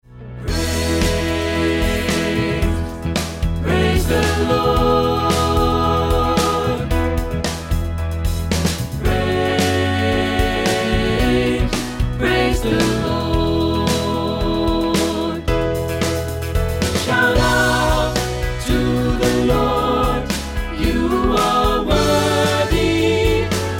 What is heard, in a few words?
Gospel Choir; Praise The Lord